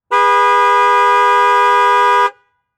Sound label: Transportation Chevy Trailblazer Honk Horn Long